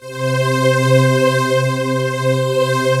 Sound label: SYN STR C4, Analog Fantasies; USB Soundscan vol.49; AKAI; YAMAHA